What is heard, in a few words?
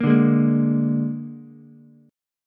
Bb 7th Bb down Ukulele; strummed; JGuitar